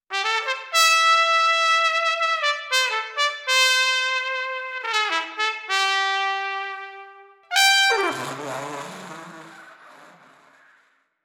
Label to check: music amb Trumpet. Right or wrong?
Right